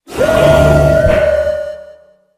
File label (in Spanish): Grito de Zamazenta